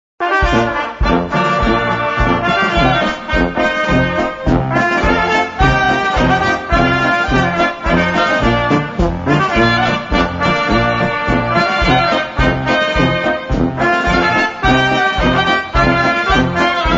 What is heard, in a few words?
SLOVAN POLKA